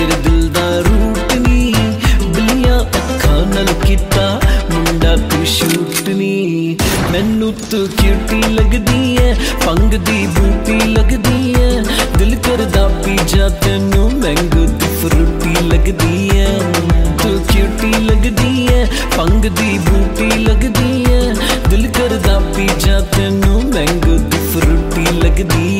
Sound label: romantic ringtone